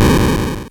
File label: gameover; Detank